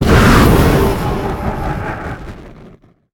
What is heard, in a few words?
activate; smite; cerberus